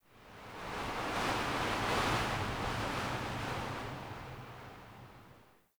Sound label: Wind Airy Gust; Ambience; Dungeons; Under City